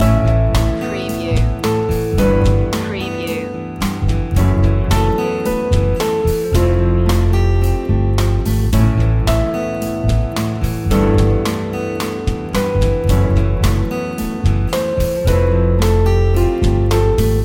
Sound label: an open heart loop